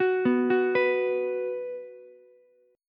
B 5th B Ukulele, chord; arpeggiated; 11,11,14,14; JGuitar

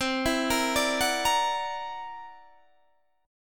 C 9th Flat 5th C down Guitar Open D

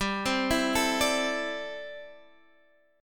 D 7th Suspended 2nd Suspended 4th, chord

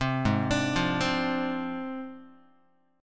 Ab 6th Flat 5th Ab, Guitar; Ab6b5 arpeggiated; JGuitar

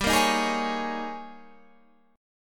Ab Major 7th Suspended 2nd Suspended 4th G

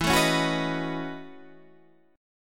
Gb Minor 13th E

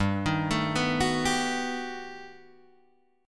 G Augmented Major 9th, Guitar, Open D

